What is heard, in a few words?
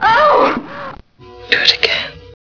pain